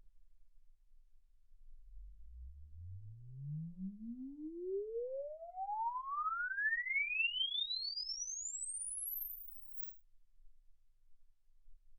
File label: exp swept sinus 10Hz 24kHz